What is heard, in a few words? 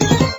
slot machine bet all